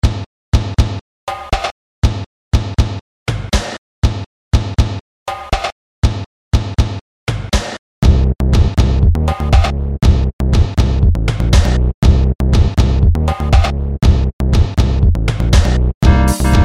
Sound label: high mystic water rhythms